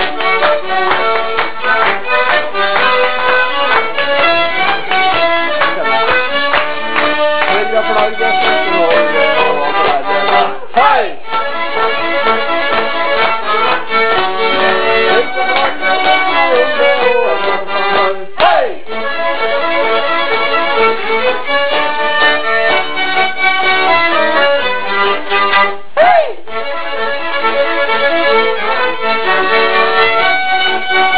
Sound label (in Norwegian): Musikk; PhD dinner